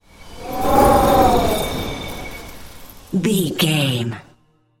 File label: wind blizzard storm spell light